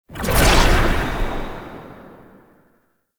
Passive Energy Pickup; Warframe; Dagath

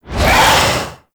Symbiotic Link Cast; Warframe; Infestation

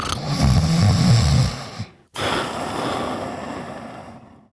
Snores, Son, Vocalizations; Warframe; Deimos

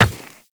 Run Rock, Footsteps; Warframe; Tenno

